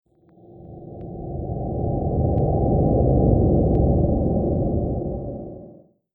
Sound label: Subtle Sci-Fi Unidentified Flying Object; Spacecraft Sound Effects